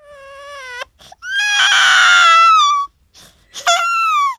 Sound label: CRY, baby